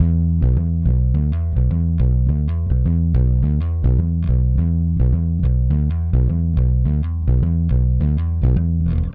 Clinical Bass; Sonic Foundry (Sony Creative Software); Indie Rock Anthems; Session 02 - Clinical

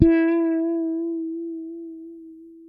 FRET E, PEDULLA BASS; Masterbits CLIMAX 7 - Bass

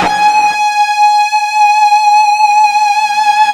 FUZZ G#4, Guitar; East West; Steve Stevens Guitar Samples Collection

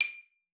D6, xylophone; Xsample VOL-4

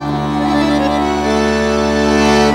ACCORD HITS; Best Service; World Colours

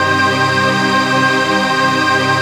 PAD, Dance Mega Synth

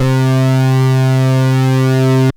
ANALOG, BASS; Best Service; Hyper Dance

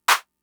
Clap, Fricke MFB-522, Drum Machines

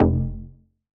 Bass F, Bass Synth

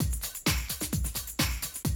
130, Classic DrumLoops

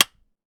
clapper, American Natives; E-MU Formula 4000 Series Vol. 4; Earth Tones

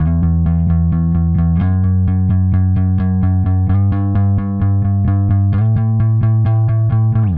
Bass, Indie Rock; Sonic Foundry (Sony Creative Software); Indie Rock Anthems; Session 10 - Roswell

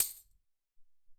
Maraca

